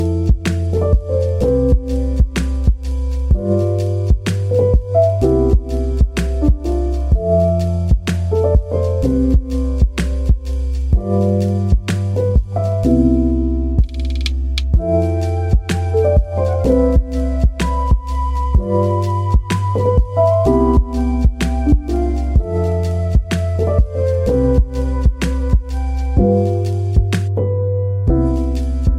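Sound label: chillout music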